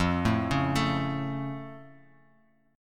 F Augmented F down

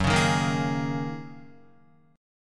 Gb 6th Add 9th Gb down Guitar

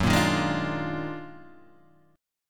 D sharp Minor Major 11th F sharp

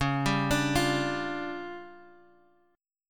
D Minor Major 7th Double Flat 5th C sharp, guitar

